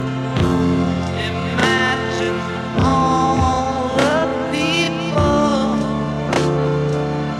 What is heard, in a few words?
Pop